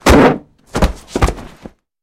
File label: Body Falls On Crate